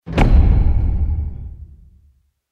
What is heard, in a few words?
match complete